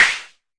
Arturia MiniBrute sn

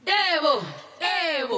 fem deh boh eh booh, FEMALE SINGING; VOCALS and SPOKEN WORD

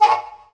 sax happy hit; Horns and Brass

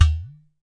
udu hit, Udu Clay Hand Drum; ETHNIC and WORLD PERCUSSION

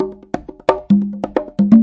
090 bongos, 090 bpm; Bazillions of Bongos

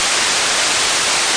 engine, VIDEO GAMES; starcrus

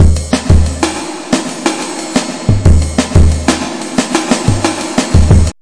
homemade, drum loops and breaks, 180 bpm; 161 to 180 bpm

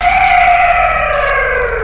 space purr; Various Strange Sounds and Effects

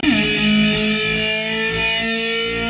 guitar car accelerate

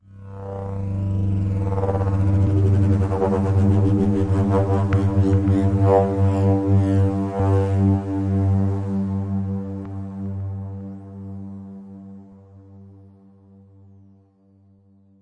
approaching spindown synth; SYNTH KEYS ETCETERA

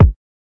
kik, kicks

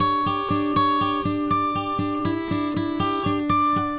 acoustic guitar picking; GUITAR LOOPS

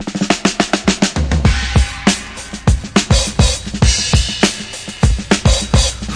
classic break, drum loops and breaks; VIBRIS CLASSIC BREAKS

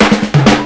reggae break, DRUM LOOPS and BREAKS

